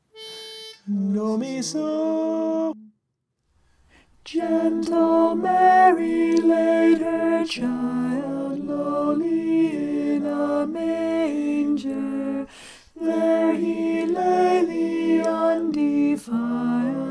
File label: gentle mary alto